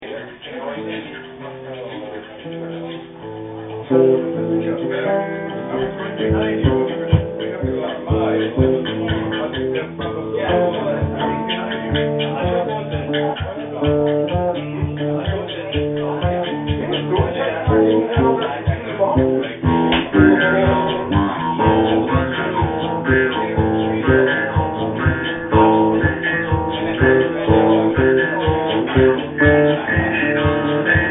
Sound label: Guitar Doing